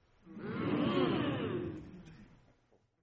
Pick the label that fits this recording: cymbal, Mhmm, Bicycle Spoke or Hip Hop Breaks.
Mhmm